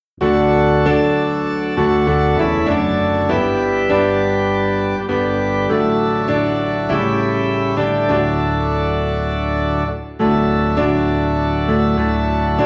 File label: Piano and Organ Low in D